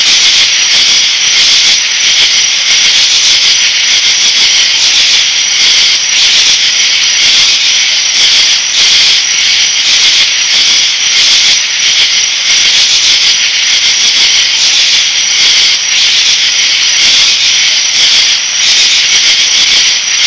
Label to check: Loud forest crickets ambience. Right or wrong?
Right